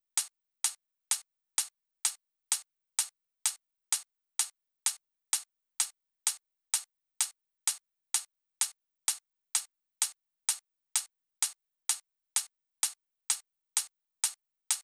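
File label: hi-hat, Drum Loop 128BPM; VEC5 Drumloops; VEC5 Multi Loops 128BPM; Loop Elements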